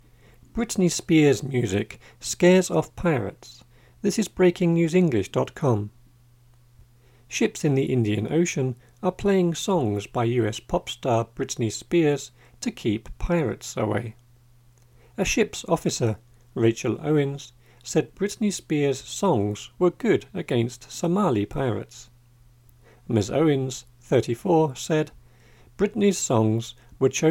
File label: loud music